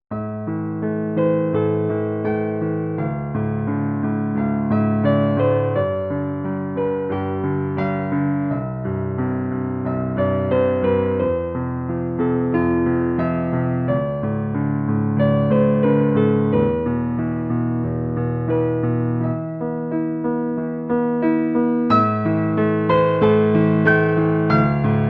piano cover

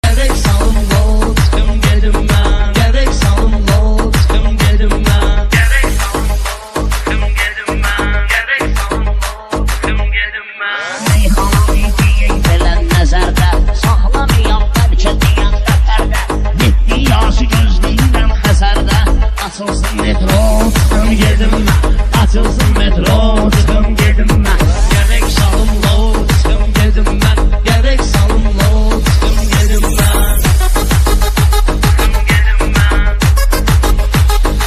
remix bass

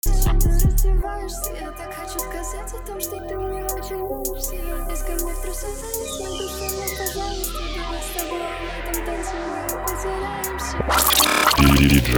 trap music ru remix

